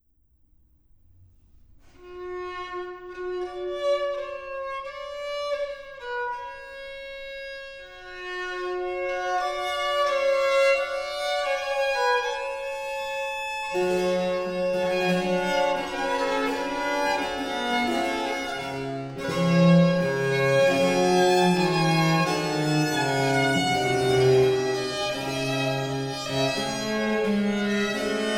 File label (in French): Prelude; Jupiter et Europe